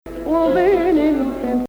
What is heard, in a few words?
Bayati, maqam